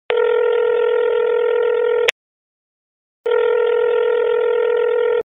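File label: sound of telephone dial tone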